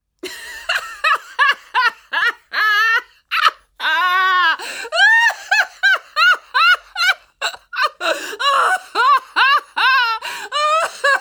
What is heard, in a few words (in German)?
Lachen